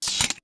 Mini Map Off

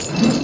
Ball And Chain Whirl

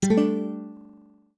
Dialogue Open

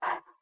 Wolf Huff Sit; Wolf Link

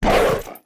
Wolf Charge Attack; Wolf Link

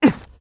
Side Jump; Link

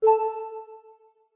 Low Health

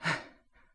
Huff; Link

